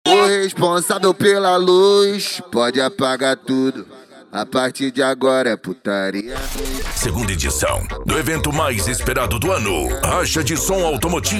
racha de som automotivo e som musical

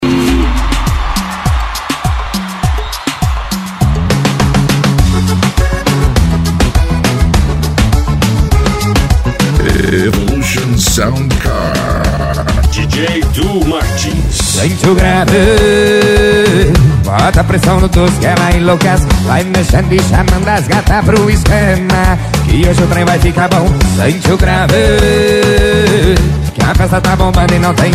sertanejo; evollution sound car

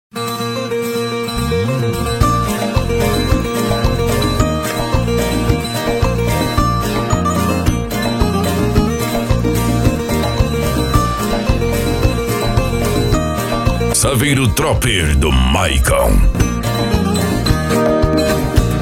pagode de viola

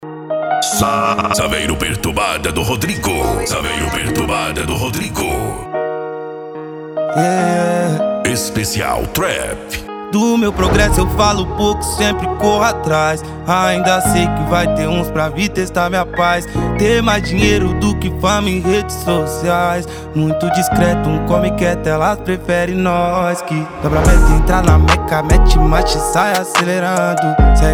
trap

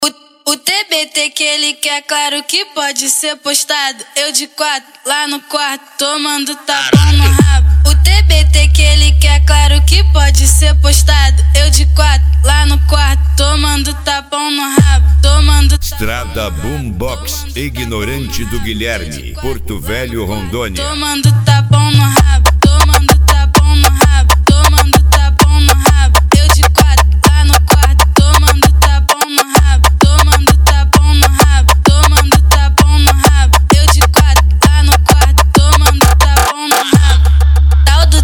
esp funk atack

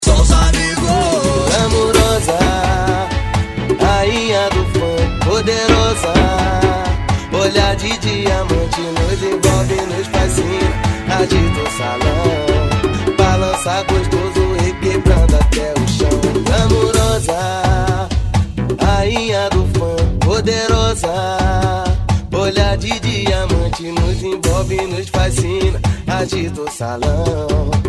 dance e funk retro; branco som

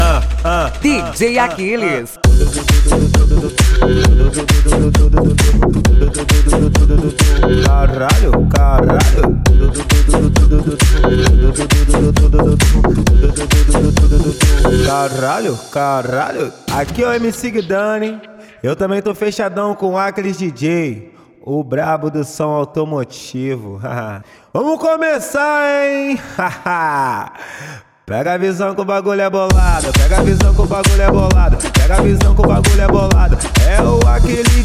hip hop mega funk

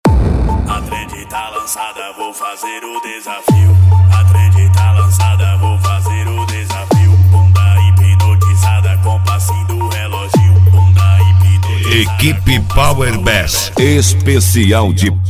pancada; equipe power bass

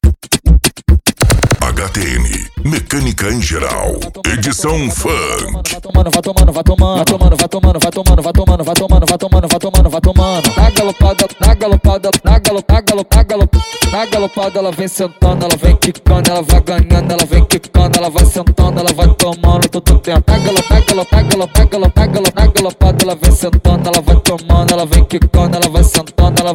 mecanica em geral edicao funk

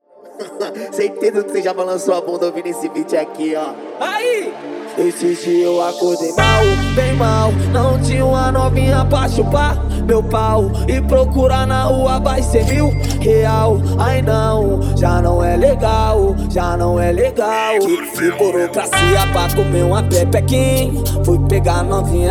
funk agressivo do sul

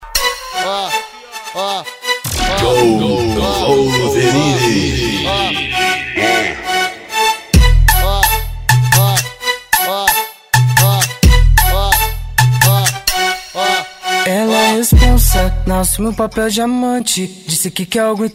especial funk

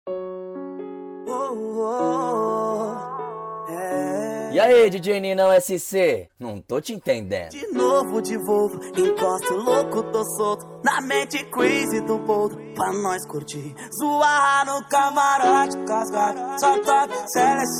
som automotivo

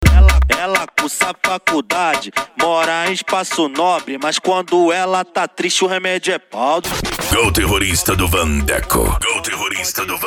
dance e funk mega bass

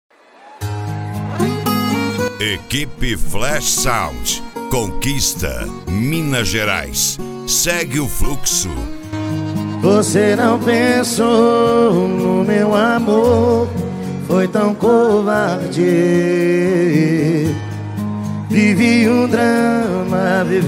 sertanejo; cd sertanejo flash sound

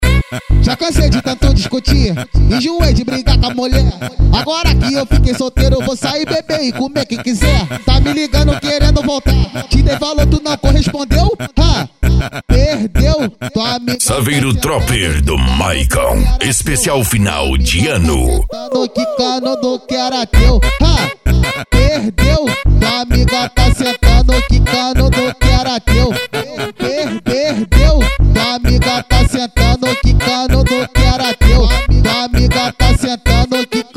funk agressivo funk

